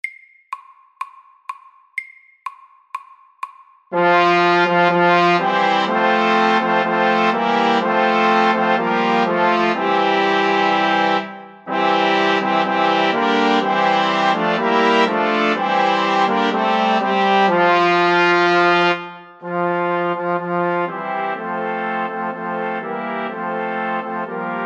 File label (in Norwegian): Fanfare for tre FAST 124